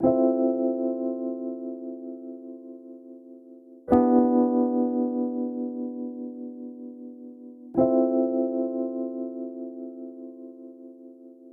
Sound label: 124bpm rhodes